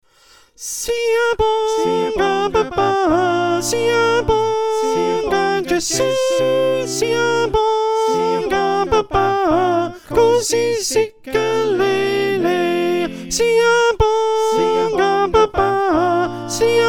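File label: Soprano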